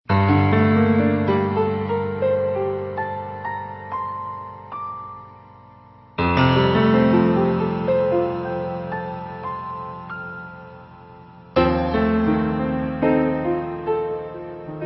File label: Classical musi